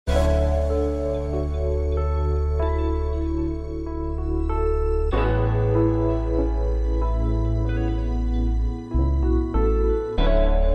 cool soul ringtone; soul 2019

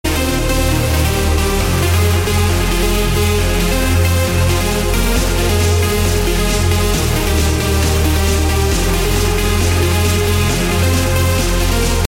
mix trance ringtone

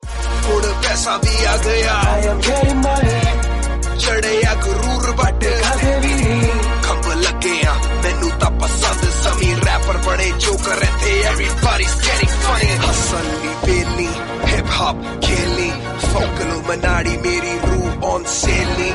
solo ringtone; english ringtones

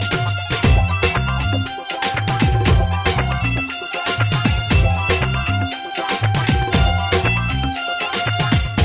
punjabi ringtone

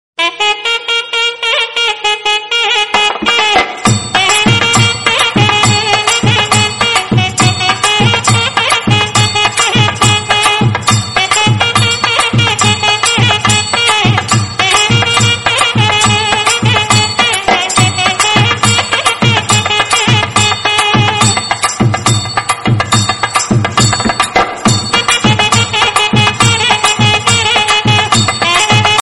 wedding happy music tone ringtone; nokia ringtones

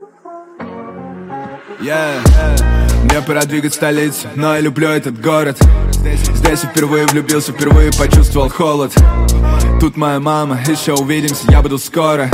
rap song tone ringtone english

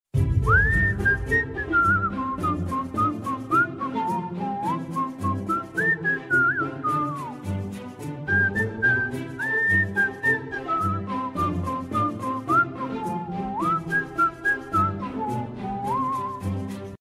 whistle ringtone; the jungle book whistle

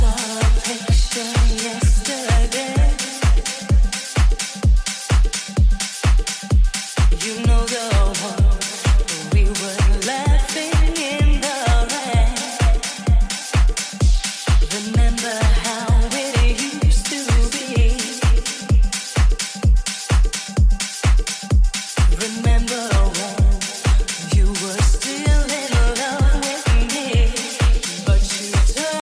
remix ringtone, english ringtones

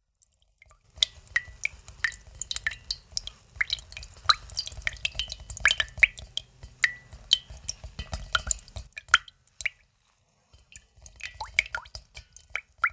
water drop from tap sound tone; iphone

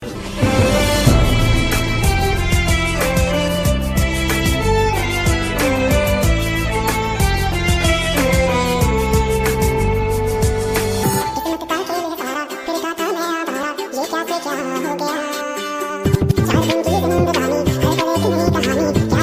remix ringtone

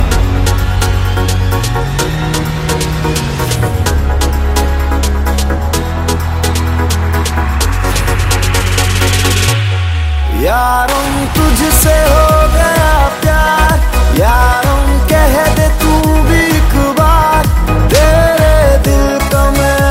bollywood ringtone; siddhanth kapoor

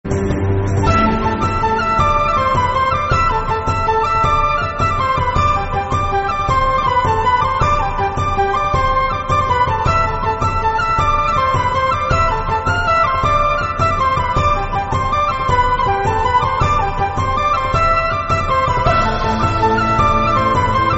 celtic music legend for cellphones ringtone

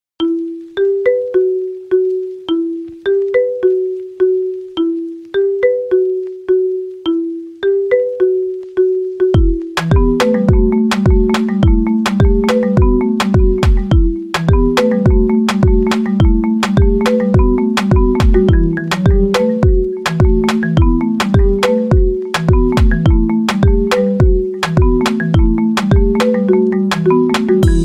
iphone remix ringtone

